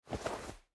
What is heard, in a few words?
Dodge; SandBox Musics